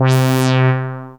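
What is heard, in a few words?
Casio MT-600 Brass Ensemble C3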